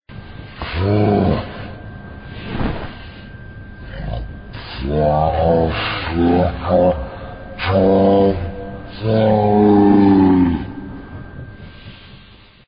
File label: fiddle; vapesquared